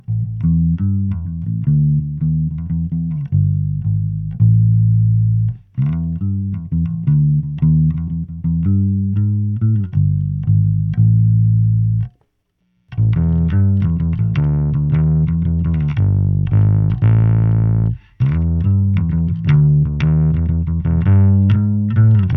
ginger, Gain 17, Bass 17, Treble 17; each clip includes a bypassed passage

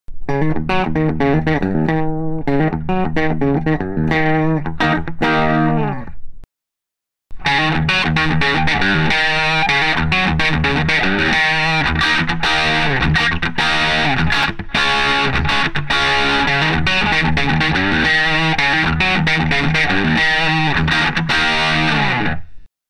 azabache, Tone 12, Gain 15, Bright On, Scoop Off; setup: pretty guitar bridge pup - UBE Screamer - close-miked Ampeg Rocket II, reverb off - sound card

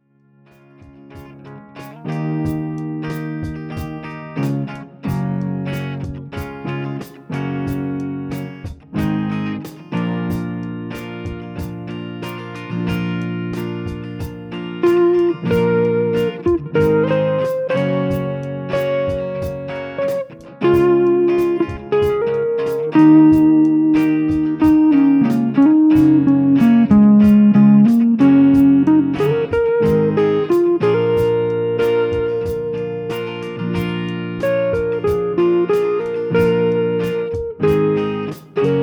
supro, Supro 16T amplifier; Dual HB guitar; Randall SS amp - mic - sound card - software reverb; (left) pretty (bridge pickup) - Volume 12:00, Tone 3:00

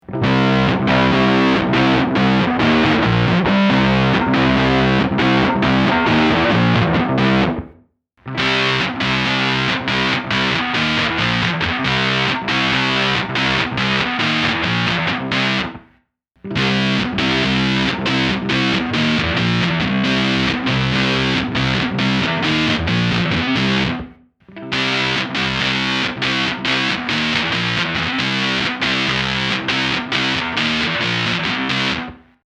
tm fuzz; Tonemender; bypassed, then Fender, Marshall, Vox; HB equipped Strat - Omega - modded Valve Jr. - 4x12 cab - mic - sound card - software reverb

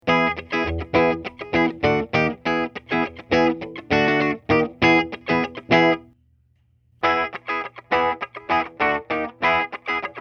omega Tele; bypassed, min. Range, 50% Range, max. Range

